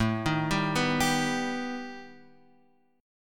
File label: B Augmented 7th A